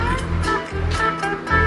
Ding

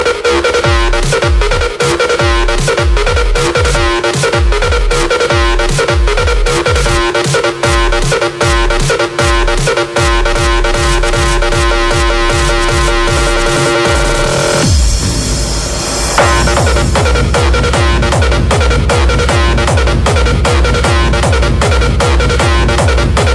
Techno; Blade

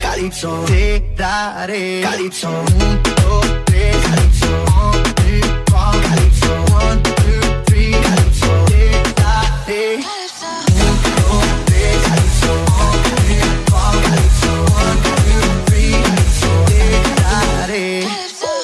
2018 remix

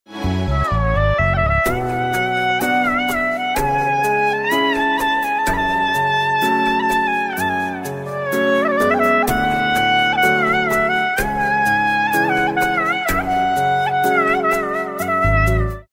Very Sad Tone

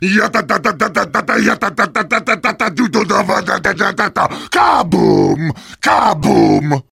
Kaboom

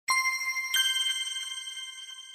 Sweet Short Message

